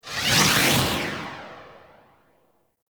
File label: Eruption Active Move; Warframe; Iron